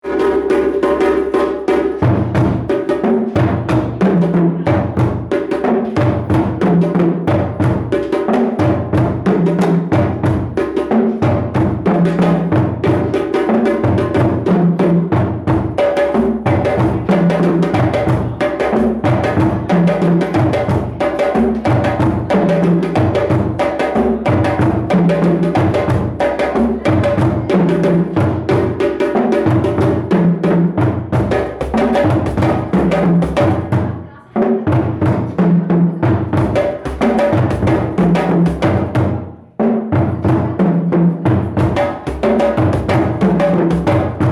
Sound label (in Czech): Bubny, djembe